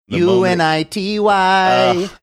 singing unity

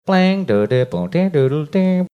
making mouth guitar sounds

